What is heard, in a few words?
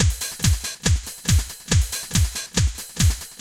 techno 140